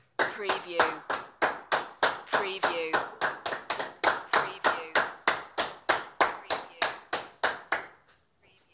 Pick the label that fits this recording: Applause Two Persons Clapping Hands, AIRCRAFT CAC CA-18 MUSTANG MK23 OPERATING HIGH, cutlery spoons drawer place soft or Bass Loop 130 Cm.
Applause Two Persons Clapping Hands